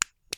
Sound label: Flashlight Off